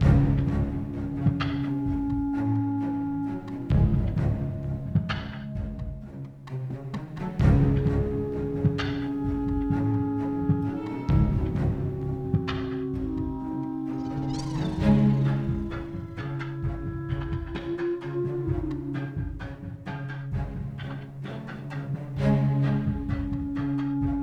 Heart Neutral

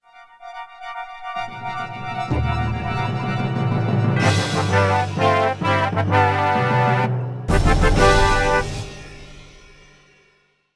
Jingle, Heavy Metal; Rayman 3 Hoodlum Havoc; GC PS2 Windows Xbox; Laser-Washing Powder; Fist

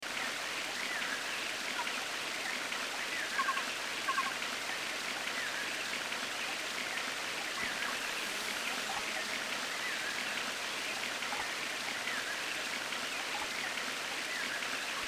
Ambience; Kolibri; 32x

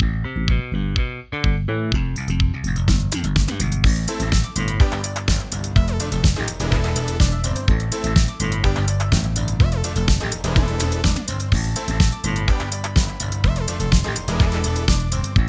Instrumental; Friday Night Funkin' Graffiti Groovin' Windows gamerip 2022

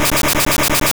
Gust Loop; Pokemon; Gen 2; attack moves; GSC

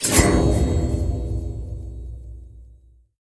Sound Promotion Out; peace death 2017 pc

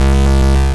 Sharpen; sfx; attack moves; pokemon; gen 1; rby

